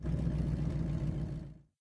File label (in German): auto motor; minecraft